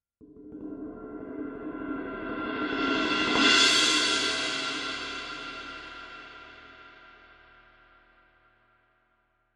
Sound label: K Constantinople Suspended, cymbal